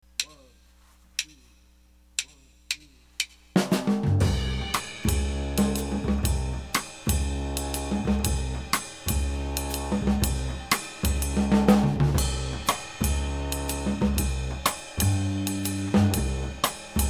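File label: bass drums only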